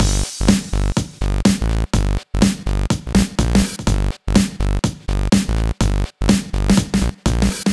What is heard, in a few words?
124 bpm full, combi loops, nu dance electro; Zero-G; Soundclash; Final Cut